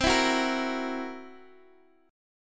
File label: C 7th Flat 5th C down Guitar Open D